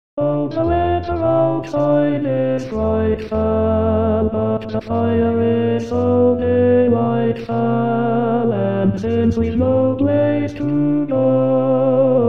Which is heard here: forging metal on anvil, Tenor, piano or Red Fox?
Tenor